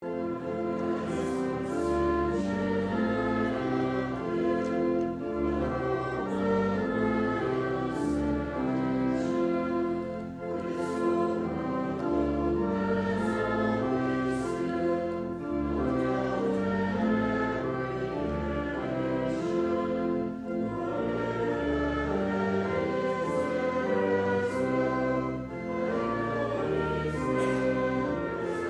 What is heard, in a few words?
trumpet